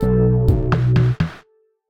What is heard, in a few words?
full outro; orrcus